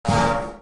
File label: Parade March; lethal company